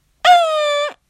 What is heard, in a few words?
lil airhorn dixie